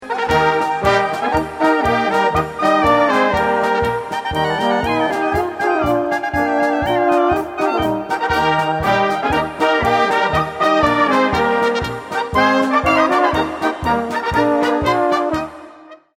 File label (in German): Goldene Tenorhörner